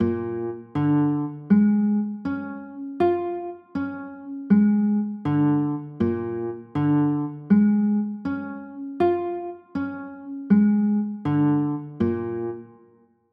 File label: a sharp 7 sharp 9; pos 2